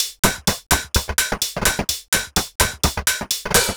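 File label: Percussion, 127bpm, Nu Dance Electro; Zero-G; Soundclash; Combi Loops; Republic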